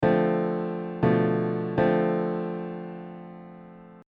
Dmin7 Bdim7; Modal Harmony: An In-Depth How-To Guide

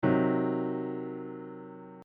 C Diminished Root Position, Triads; Triads and Pseudo-Triads

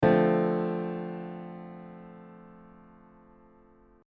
Dmin7; Modal Harmony; Modal Harmony: An In-Depth How-To Guide

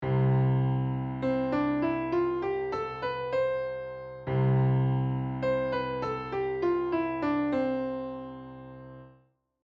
modes ionian

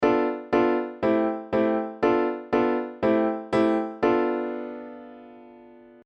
Locrian; Modal Harmony: An In-Depth How-To Guide

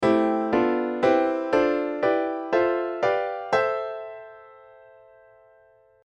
Aeolian; Modal Harmony: An In-Depth How-To Guide

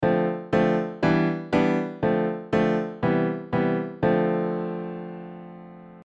Dorian prog; Modal Harmony: An In-Depth How-To Guide

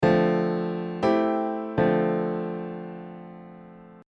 Dorian i v; Modal Harmony; Modal Harmony: An In-Depth How-To Guide

